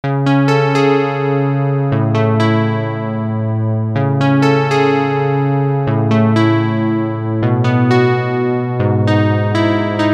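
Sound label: DSS Square Saw Sequence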